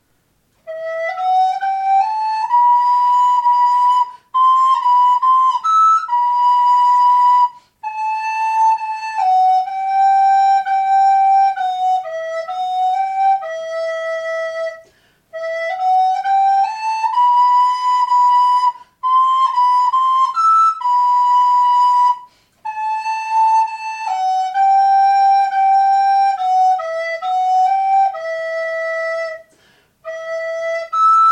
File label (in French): flute